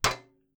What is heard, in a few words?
metal box; Phasmophobia